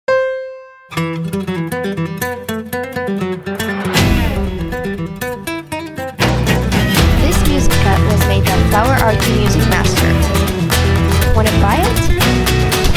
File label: music rhythmic gymnastics